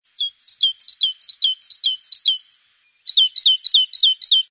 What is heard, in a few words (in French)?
mesange charbonniere